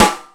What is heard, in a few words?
METAL SD, MOD. DRUMS; East West; Global Sounds